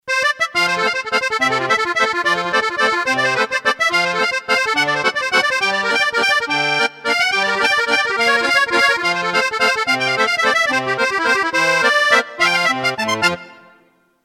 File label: Accordion